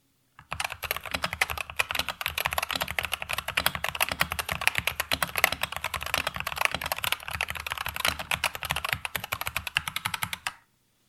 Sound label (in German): Function 2 Normales Tippen